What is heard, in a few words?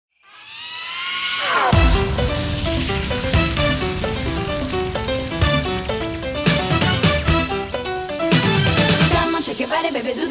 Conga